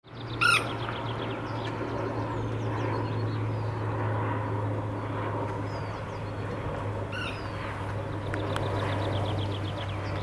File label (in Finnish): liejukana; varoitus; linnut